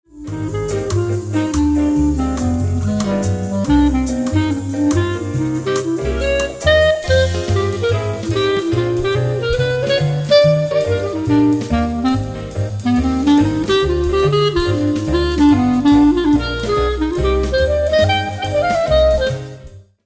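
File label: Clarinet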